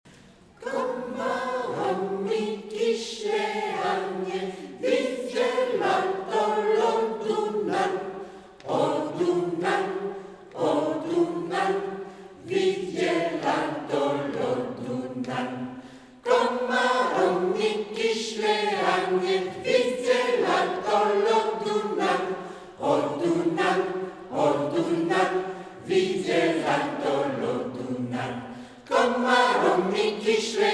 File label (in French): chant hongrois